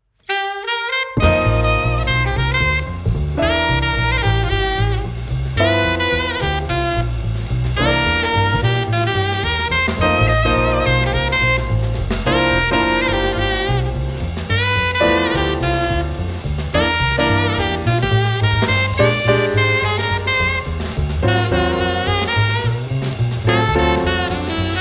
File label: JAZZ, piano, sax, drum, doublebass